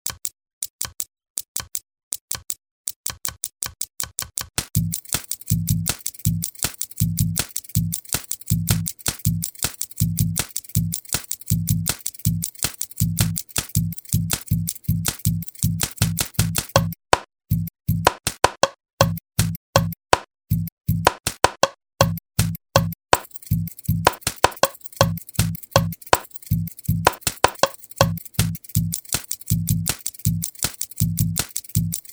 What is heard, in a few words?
Percussion